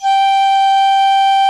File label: PICCOLO G5, WOODWINDS; Greytsounds VOL-1